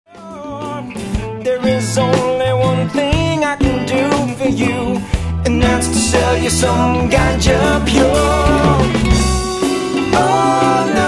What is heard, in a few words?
Reggae Fever